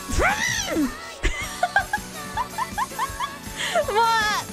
Malding noises

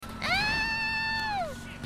Scream; IRyScream

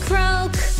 croak

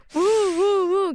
Wuwuwuw

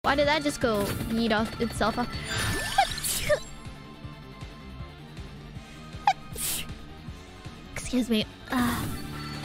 Sneeze

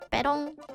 noise; Yoshi

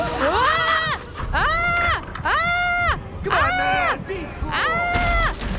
Scream woaaaah aaah aaah; IRyScream

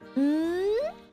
mmmh; noises

